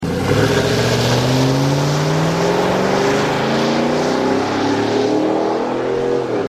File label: avalanche touring exterior launch, exhaust systems; corsa